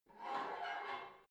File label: barbell heavy plate pull out quick reverby, fitnessstudio